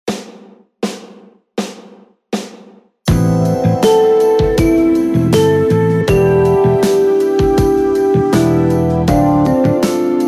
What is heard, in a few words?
Ballad Am